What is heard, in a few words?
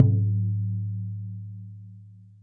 okedo A4, Japanese Drums; ETHNIC and WORLD PERCUSSION